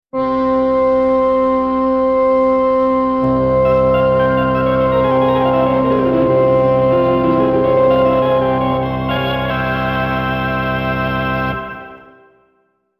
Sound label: MkII Church Organ 2 Intro